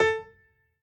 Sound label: piano hi